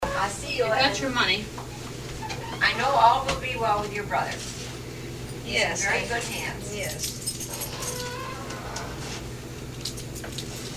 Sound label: Singing to a Cat